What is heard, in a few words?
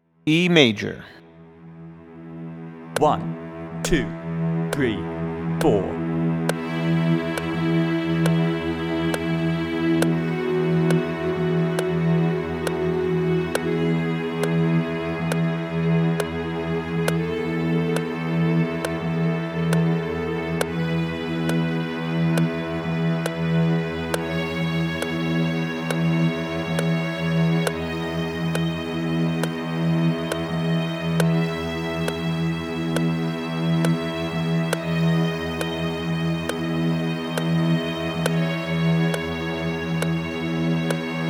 E Major Scale with Drone; one-octave scales with drone; Automate and Motivate 21st Century String Players with Technology